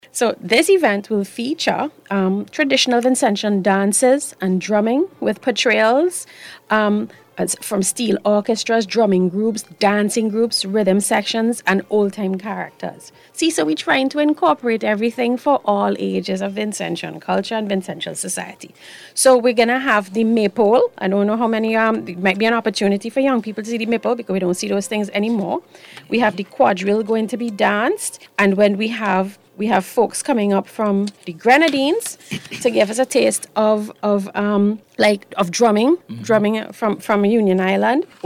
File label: DRUM AND DANCE; Invest SVG; Drum and Dance event